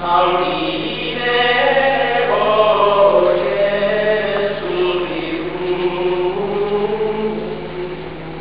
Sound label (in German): gesang; ST-XX Amiga Soundtracker Sample Packs